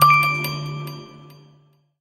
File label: Raindrop, notifications; OnePlus 11